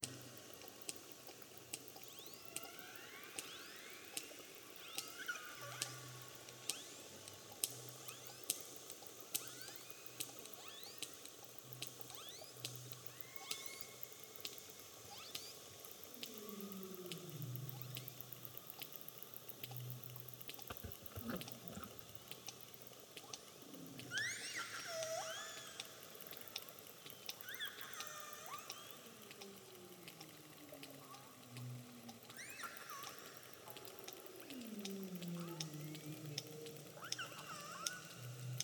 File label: Cockail Party Arctic; CNRS UTLN